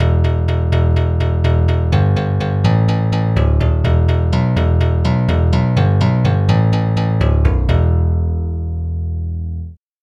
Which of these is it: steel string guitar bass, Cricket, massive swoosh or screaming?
steel string guitar bass